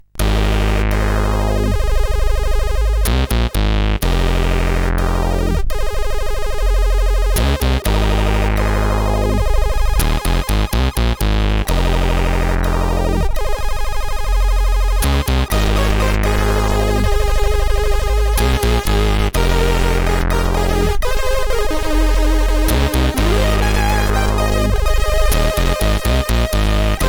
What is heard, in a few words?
Heartbeat Intro, CSG8580R5